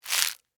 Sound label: Forest Branches, Footsteps, Forest, Sticks, Branches, Single